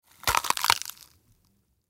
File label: Crunch Impact Twist Break Rip Open; Crunches; Objects; Blastwave; Foley